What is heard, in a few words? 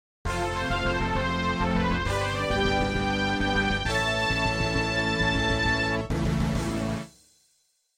Midi Victory; Shining Plume 2 Windows gamerip 2017